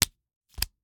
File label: Slap bracelet, smack, slap, whip, felt, plastic, hit, hold, continue; Eiravaein Works; Vaeyan IV